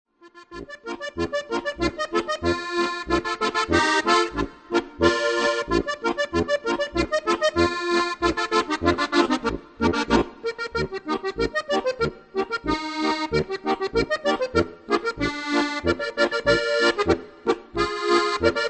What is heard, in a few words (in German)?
polka